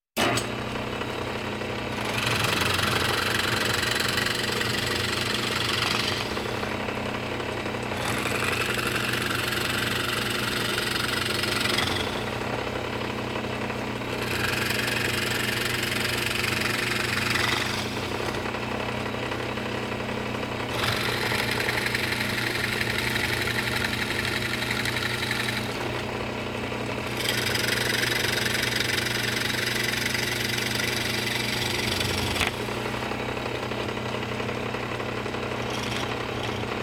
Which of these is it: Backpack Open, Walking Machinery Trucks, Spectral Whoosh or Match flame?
Walking Machinery Trucks